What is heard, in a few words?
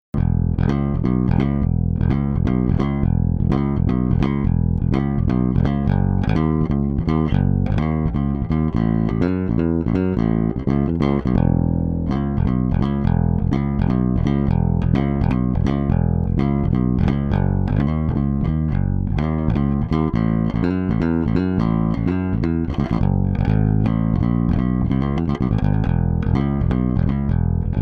BASS H BYPASS